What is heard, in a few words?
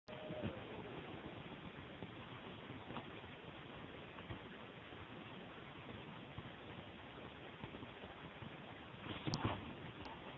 Owls